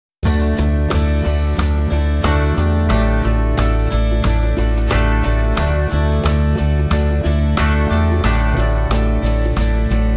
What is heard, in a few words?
acoustic worship